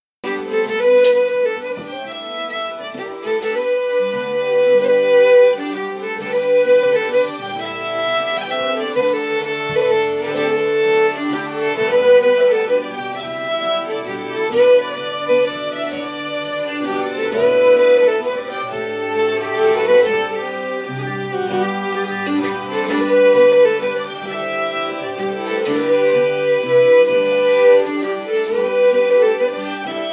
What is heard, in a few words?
Waltz, Session; Resipole